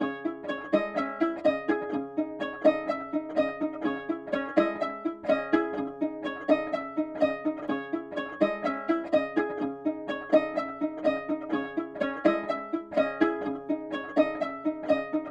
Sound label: 125 BPM C# Min, Melody, Trap; Cymatics; 2022 Melody Collection; Trap Pt 2